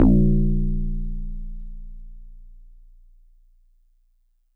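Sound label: Metal Tube DX100 A sharp 6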